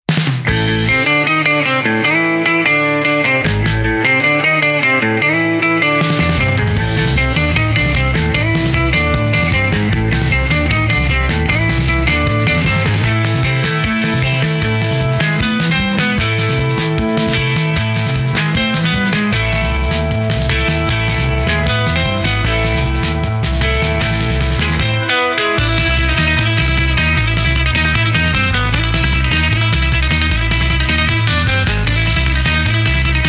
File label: Instrumental